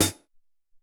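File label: Heavy Hat Closed, Alesis SR-18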